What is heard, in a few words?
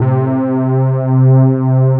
Synth Pad C3